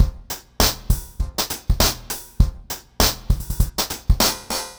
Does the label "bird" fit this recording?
No